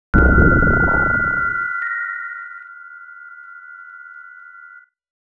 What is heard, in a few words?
Teleportation end; mother2